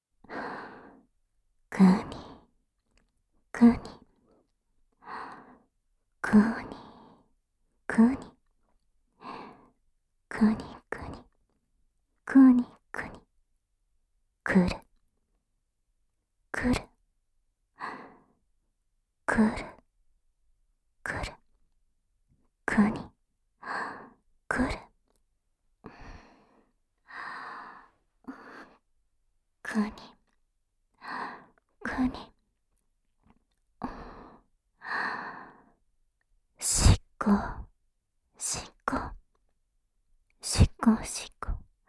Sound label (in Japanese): ねっとり乳首責め手コキループトラック, ASMR